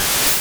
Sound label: Fury Swipes